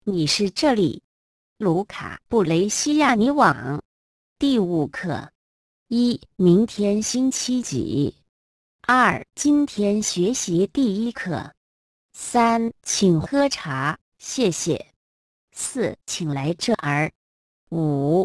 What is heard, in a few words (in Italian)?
dettato